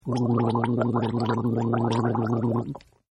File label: Gargle; Gargling; Sound Effects:Voice